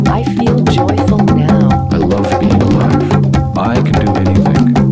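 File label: rhythm; endorfun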